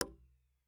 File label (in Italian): ir sub legno, dml legno adesivo; magnetostrittivi